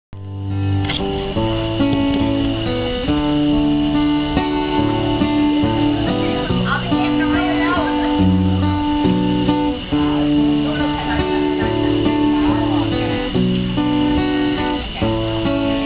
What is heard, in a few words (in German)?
saenger; thai cambodia